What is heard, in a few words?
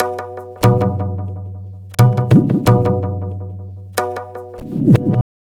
Tabla, 90 BPM; Zero-G; Pure Tabla